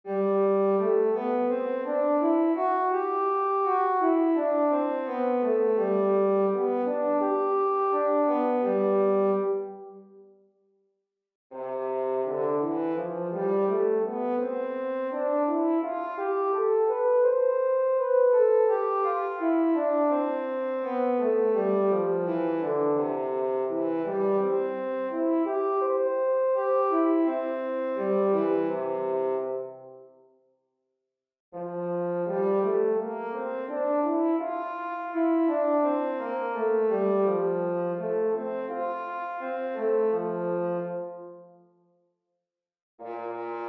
scales french horn

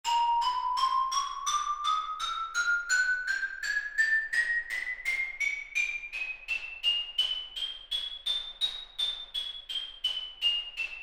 chromatic scale mallets

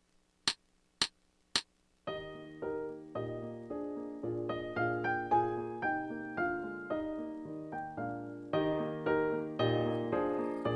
ballet of shephards flute solo piano

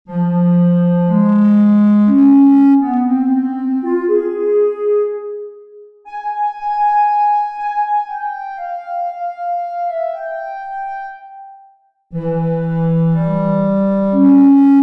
clarinet lyrical; all state

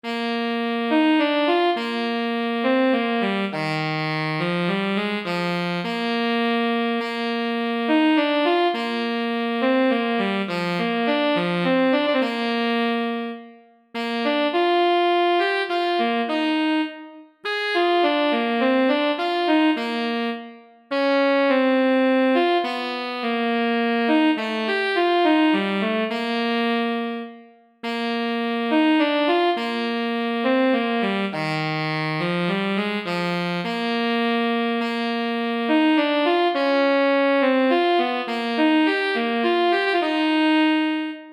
tenor sax audition

